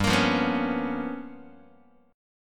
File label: G 7th Sharp 9th Flat 5th G chord, Guitar; G7#9b5 strummed; JGuitar